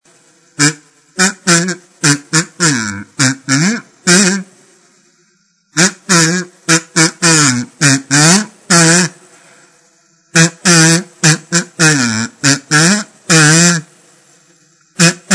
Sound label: KAZOOED; artificial recreation